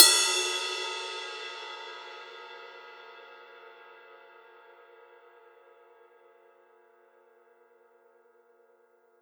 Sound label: Ride Cymbal; drumthumper; oboe